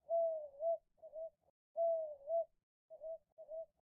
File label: Bird; lethal company; ambience; windows